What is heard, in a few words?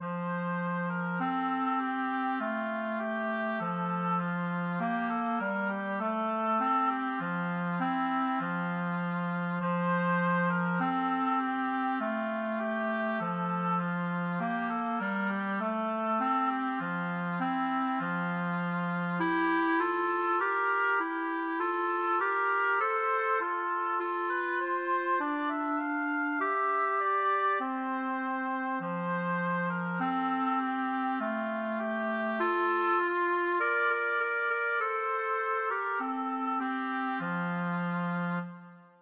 clarinet-oboe duet